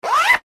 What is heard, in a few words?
zipper; foley